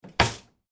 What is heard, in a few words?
household drawer cutlery close; Cutlery Drawer Close ( Kitchen ); Drawers; McKinney Sound